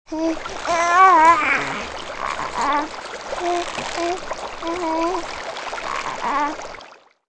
basket

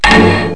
industrial hit

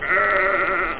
sheep; da capo vol1

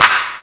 Clap; AlgoMusic; Rhythm

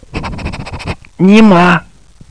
pain; DUKE RUS

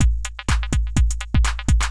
DRUMLOOP HOUSE 125; eJay

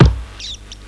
fox; Little Red Riding Hood

